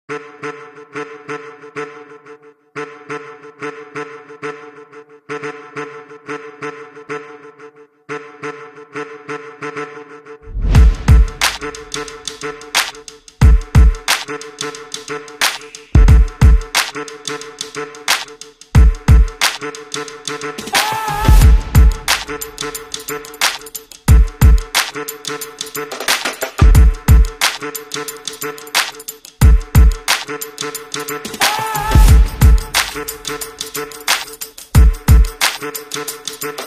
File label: Cool Upbeat Background Music For Videos TROMPETA PARA PRENDER